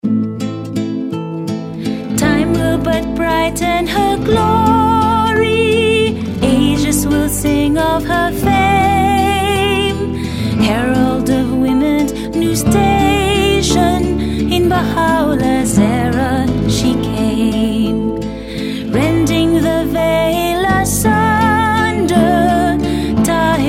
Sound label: Song, EN